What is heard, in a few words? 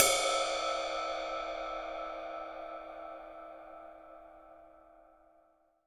24 Ride; hydrogen drum samples